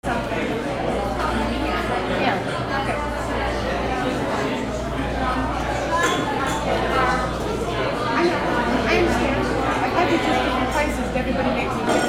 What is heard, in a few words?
AMBIENCE